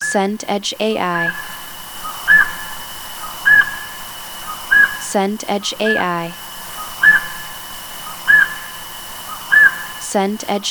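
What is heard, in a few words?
ambience forest night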